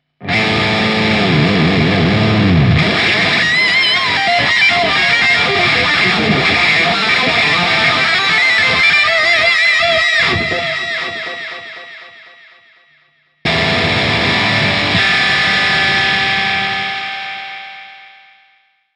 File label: Guitar Riff; Yakuza Like a Dragon; Akuma